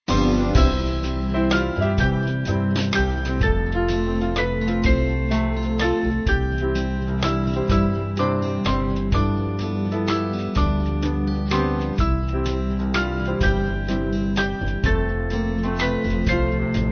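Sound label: Band, Free Music Backing Files for Churches; Sweet Hour; Bradbury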